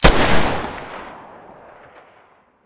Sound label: muzzle deagle mono, weapons